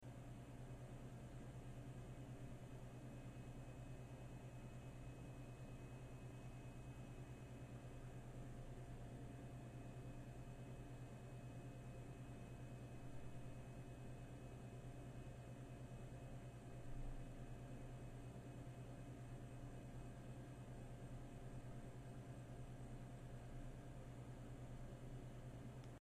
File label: vend mach amb